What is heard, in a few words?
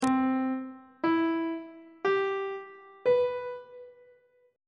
c lydian 7th chord 1 is c major 7th on treble clef